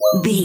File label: ui menu button beep